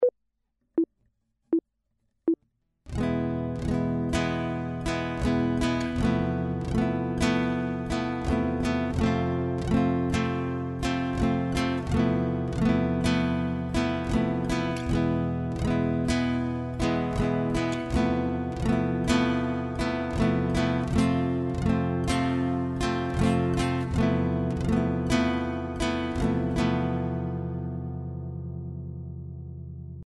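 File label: chord, guitar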